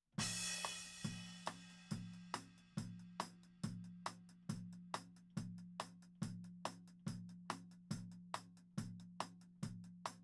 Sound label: Toms